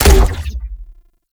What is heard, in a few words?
Grenade Shot; Grineer; Weapons